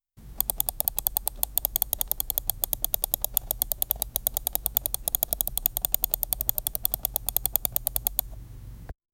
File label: long smooth scrolling of, mouse wheel